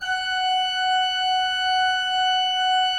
F5, Cathedral Organ; ILIO; Propeller Islands

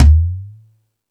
Thundadome, E-MU Pro-Cussion

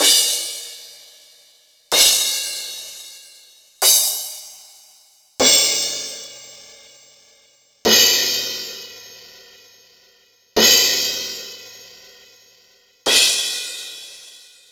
Yamaha DTXpress Cymbal

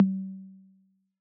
Analog Tom; Yamaha DJX-2B

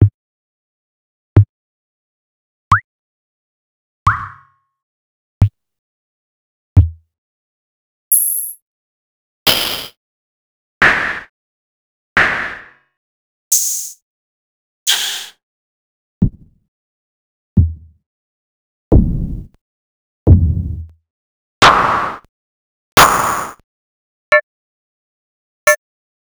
Kwerk Drums; X-Static Goldmine 5

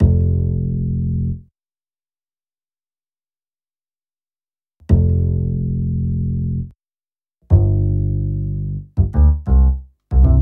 BASS, Hip-Hop & R&B; USB Soundscan vol.47; AKAI; HIHHOP

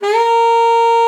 SLID A#4, ALTOSAX; Xsample VOL-2

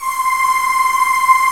Vapor Vox C5; Digital Sound Factory Xtreme Lead

